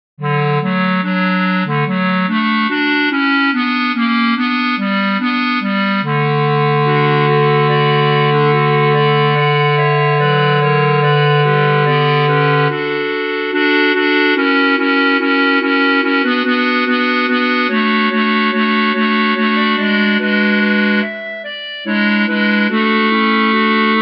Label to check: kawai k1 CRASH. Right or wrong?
Wrong